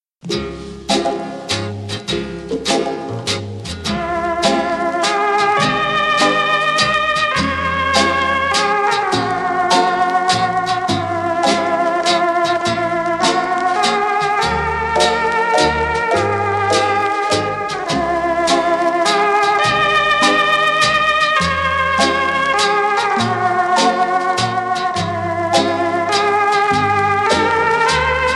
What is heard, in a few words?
SLOW WALTZ MEDLEY, HAMMOND